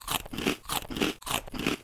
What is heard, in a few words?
hover crunch